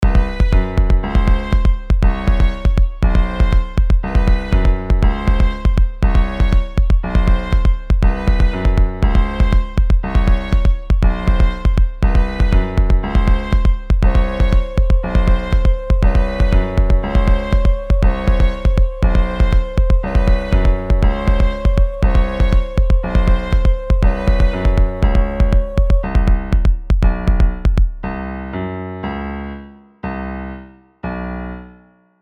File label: random ringtone